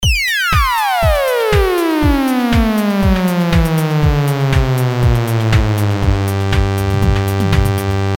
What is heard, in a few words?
down pitch